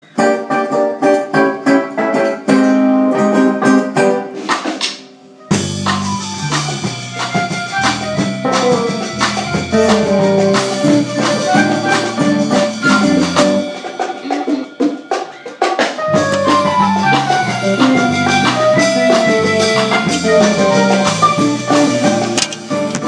Samba; Brazilian

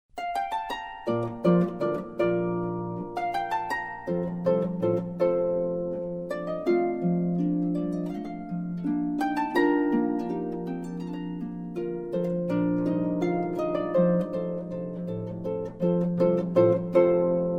Handels Harp Concerto in B 1st mtv, Harp; Lizary Rodriguez

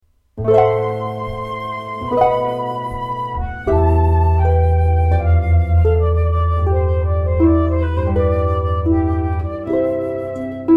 flute and harp; Wedding March

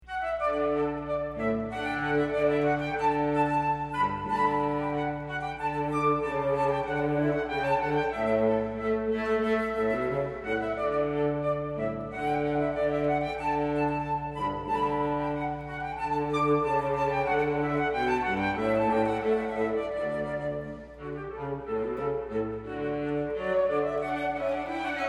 Rondeau, Chamber Music

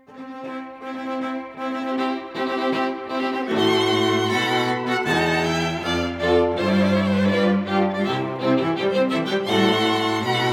string quartet

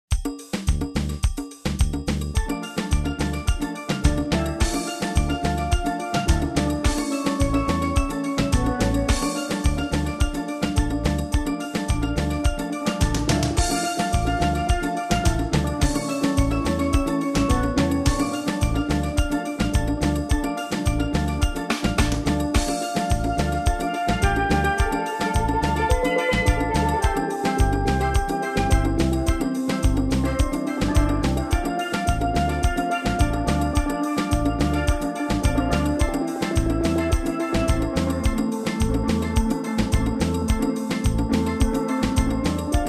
Steel Drum

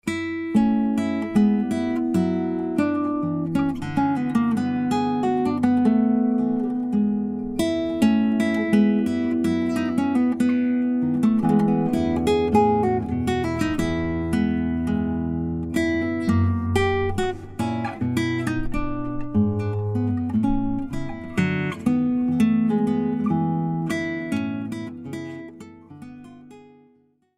voyons, Classical Guitar

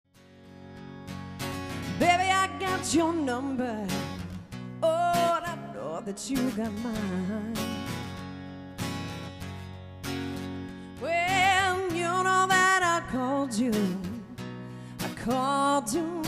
Jazz Blues Duo, sax guitar

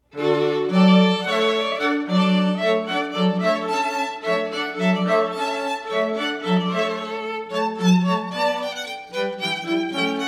Hornpipe, String Quartet; Water Music

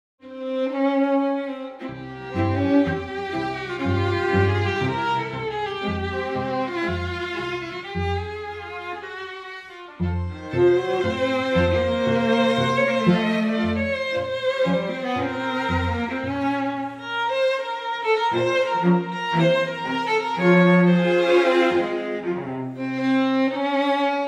Tango, String Quartet; Eloquence String Quartet

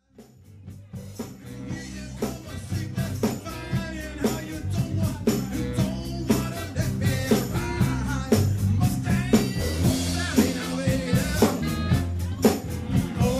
LIVE, Dance

